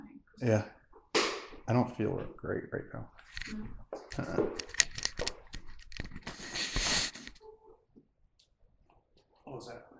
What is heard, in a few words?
Mourning Room EVP